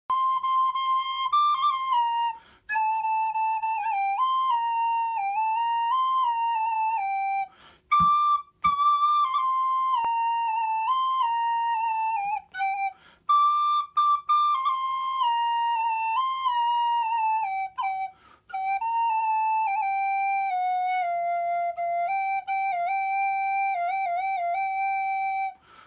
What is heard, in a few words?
sopilka